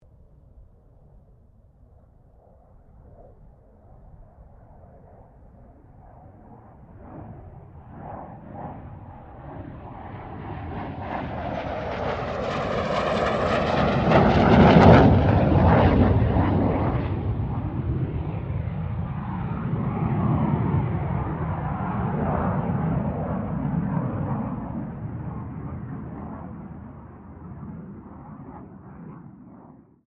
AIRCRAFT CA-27 SABRE PASS; CA-27/F-86 Sabre; Airplanes; Vehicles; Aircraft Collection

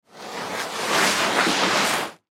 Canoe Drag On Shore Sand; Transportation Watercraft; Dragging Sound Effects; Dragging (Sound Effects:Objects); Blastwave

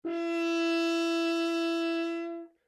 HORN E5 F LONG STOPPED, French Horn in F; Alexander French Horn in F; Horns (Sound Effects:Musical)

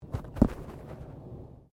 Body movement Foot Foley

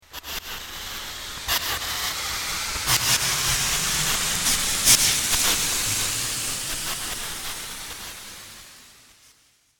Alien Hyperdrive; Conflict Earth - Alien Hyperdrive; Alien Sound Effects

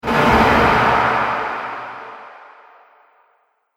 Epic Sf Explode Impact; Sci Fi Sound Effects

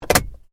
Coin Tray Close, car; Hyundai Accent 2008; Hyundai hire car; Vehicles

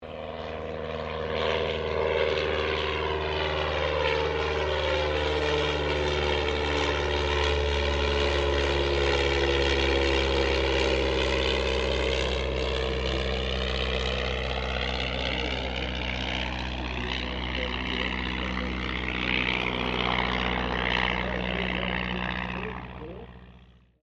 AIRCRAFT DH82A DEHAVILAND TIGERMOTH PASS SLOW PA; RAAF Tigermoth; Airplanes (Sound Effects:Vehicles)

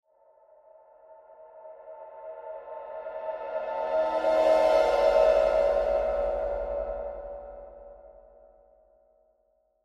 Horror Cymbal Swell Ghostly; Scary Tones; Blastwave; Sound Effects:Horror

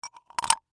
Bolt Insert, Into, Hole; Machinery Sound Effects; Machines

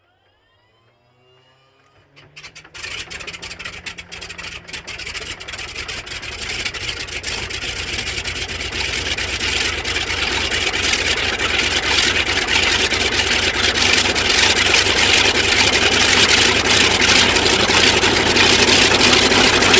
AIRCRAFT CA-13 BOOMERANG STARTUP STEREO; Airplanes (Sound Effects:Vehicles)

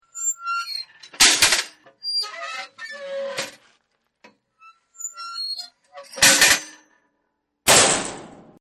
Squeaky Gate; Gates; Doors

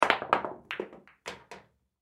Pool Shot Shoot; SPORTS; Blastwave

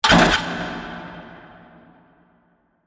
Hit Metal Reverb; Production Element; BlastWave

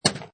Pinball Sound Clunk

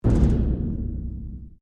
Strike Metal Hollow Tank Soft; Materials

